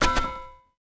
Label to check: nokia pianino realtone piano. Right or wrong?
Wrong